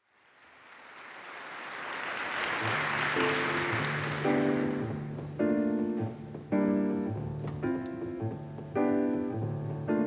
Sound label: Mirage piano solo; wurzburg germany 1973